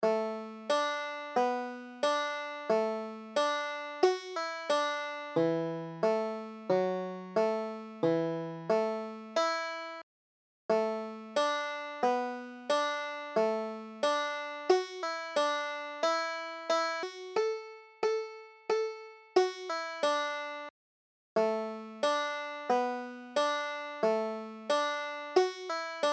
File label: sarah armstrong, banjo